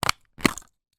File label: medical hospital thermometer plastic case open; zapsplat